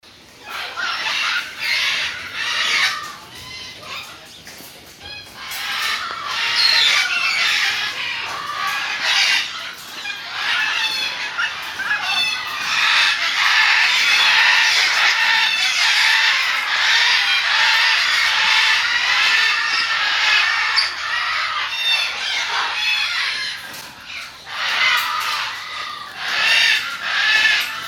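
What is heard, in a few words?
Macaws (mixed species), parrot calls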